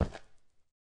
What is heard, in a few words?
Ice mining